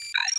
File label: notifications, SMS; RED Hydrogen One